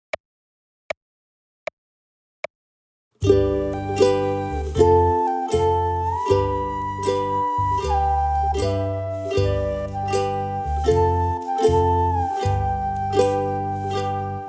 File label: Ukulele